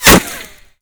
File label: impact masonry; Modern Warfare